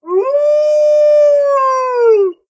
howl, wolf

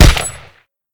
MP5 Shoot; Modern Weapons Pack

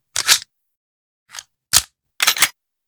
luger Reload; Modern Weapons Pack

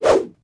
blunt attack; matmos interactions

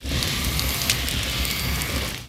breathe, mob blaze

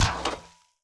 walk, mob iron golem

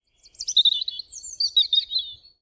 chicka; matmos hl; wildlife

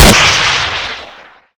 RPG Shoot; Modern Weapons Pack

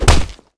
hurt flesh, damage; HunterZ G4; minecraft

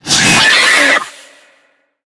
scream, mob endermen

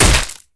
blunt hit; matmos interactions

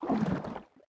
water underwater; matmos interactions

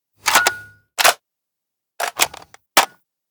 BAR Reload; Modern Weapons Pack

